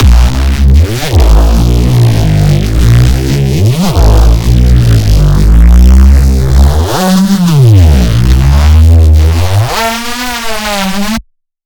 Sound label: weird, bass